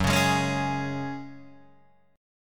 Gb Suspended 2nd, Guitar Open D

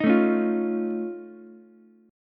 F Augmented 7th F Ukulele, chord; F+7 strummed; JGuitar

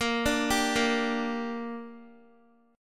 A sharp 6th, Guitar; A#6 arpeggiated

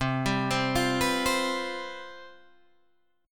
C Major 7th Suspended 4th, down, Guitar, Open G

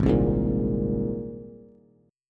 Ab Major Add 9th Ab Bass; Abadd9 strummed; JGuitar

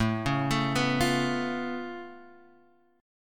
C sharp Augmented 7th A, chord

